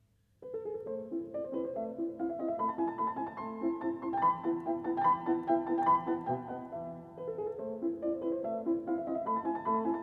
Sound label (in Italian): Sonata per pianoforte in la maggiore Alla turca Allegretto